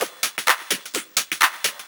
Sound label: FX Loop 128 BPM, House